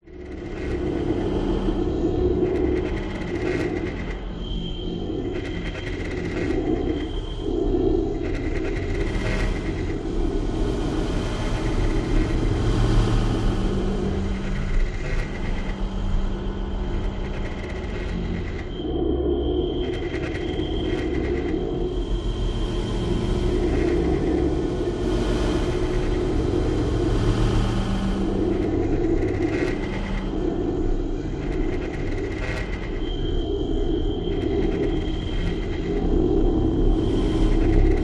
science fiction force field electric futuristic buzz static drone; zapsplat